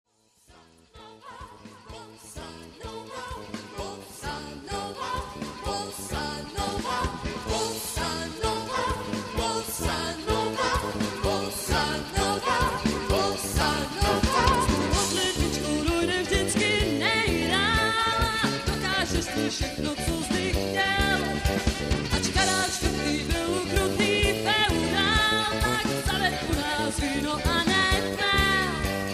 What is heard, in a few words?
Bossa Nova